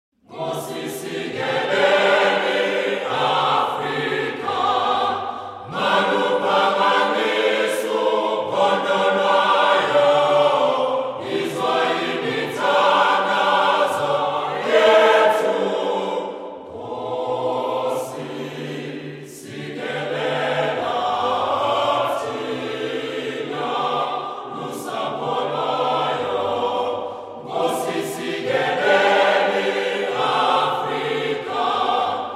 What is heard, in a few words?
African Methodist Choir